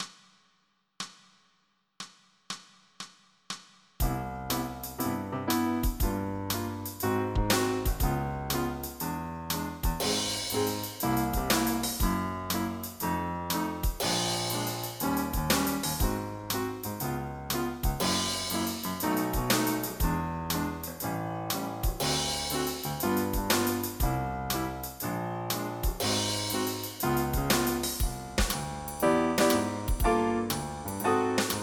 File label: blues; 3800 MIDI Magic